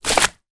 wet towel drop on ground; foley; zapsplat

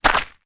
foley footstep single on gravel dirt; zapsplat

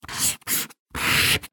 Handwriting Marker; Copics; soundbits

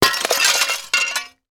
impact glass window smash; sound effects one

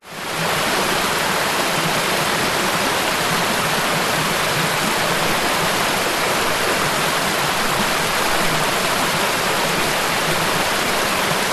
nature creek forest fast flowing

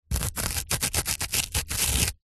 foley dirt thin layer scrapes

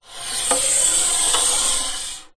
metal pole slide; foley

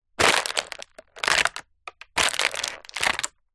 foot step on squash plastic easter egg case

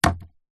industrial duct tape roll set down wood

